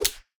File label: mob spanking hard